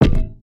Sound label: Spider Cage Thud; creatures organic